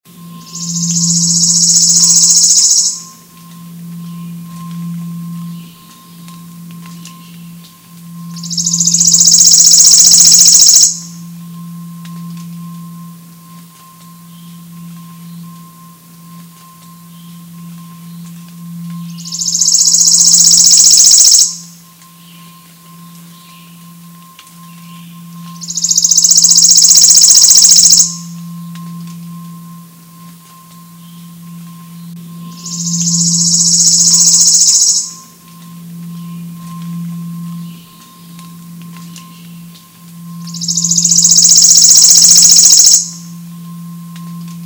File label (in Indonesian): suara burung worm eating warbler